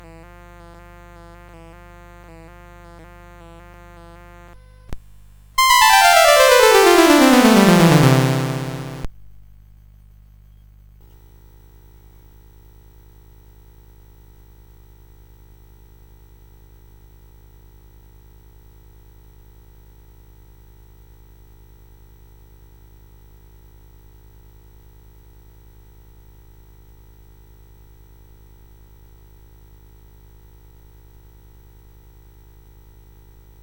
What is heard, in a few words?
Glissando on a Piano; CSG8580R5